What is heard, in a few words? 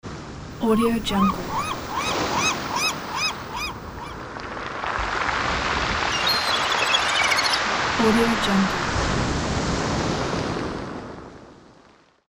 sea gulls